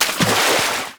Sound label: Bodyfall Deepwater; SFX Kit; Bodyfalls